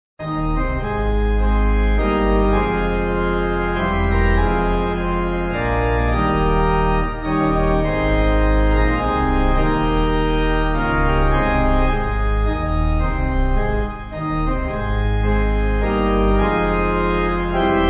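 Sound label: Pipe, Pipe Organ; National Anthem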